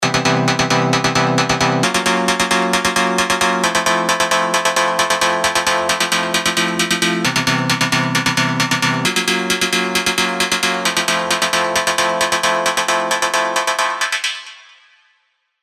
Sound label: Piano; spire old skool